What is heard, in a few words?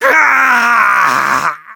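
pain critical death; Sniper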